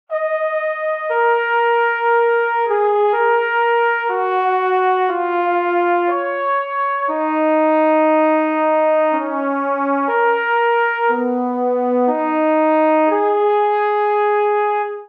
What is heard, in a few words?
Phrase Sympathetic Eb Minor 60 bpm, Brass Quartet; MIDI Clips; Ableton; Spitfire Audio